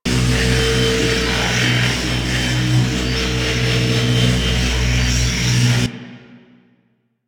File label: Medical Pod Scan Loop, Ship; Ambience; Props; Warframe; Corpus Ship Remaster